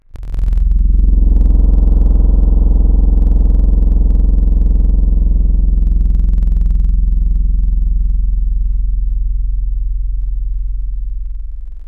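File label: brass French Horn hi, Wavetables; Synthetic's Serum Collection; Standalone-Music SYMPHONY Wavetables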